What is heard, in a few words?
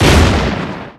explosion